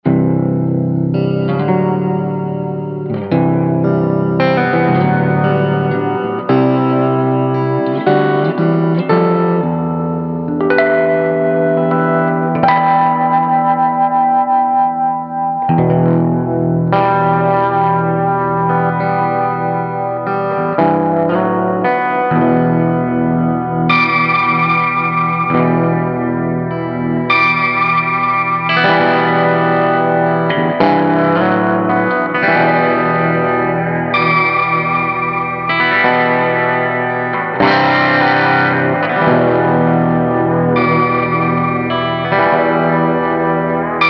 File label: broken bell heavy reverb tele; Swart Atom